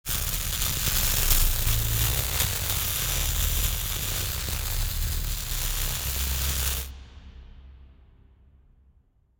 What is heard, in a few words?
Electrical Sparks Wet Horror